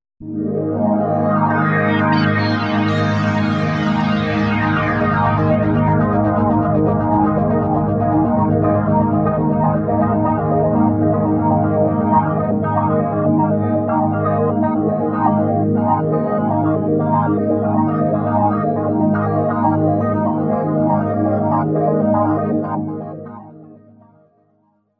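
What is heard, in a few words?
sync rhythm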